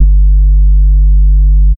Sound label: Sub Bass